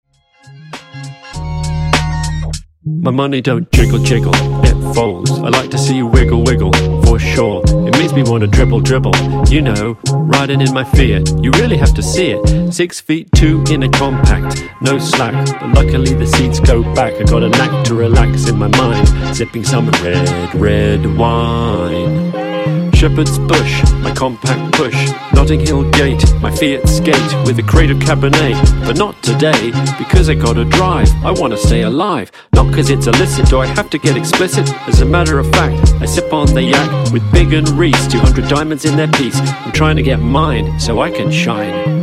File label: Funk Wordplay Transition 100 95Bpm